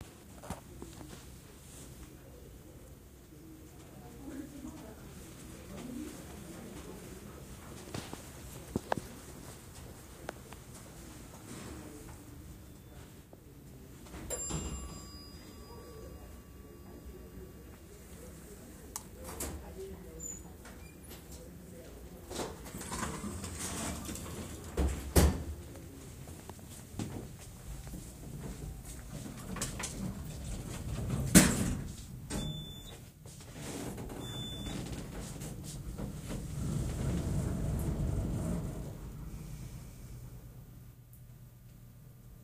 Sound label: elevator